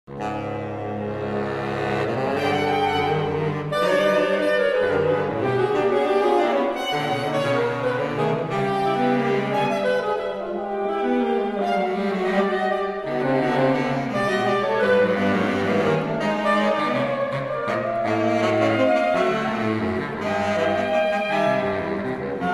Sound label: Tipsy Waltz, Small Consortium